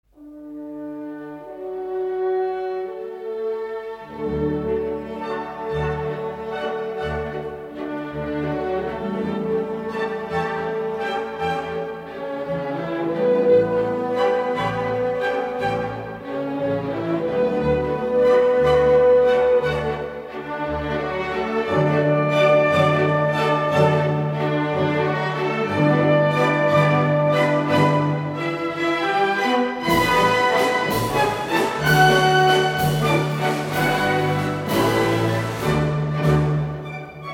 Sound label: blue danube waltz